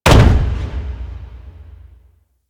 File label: Infested Golem Step Default; Warframe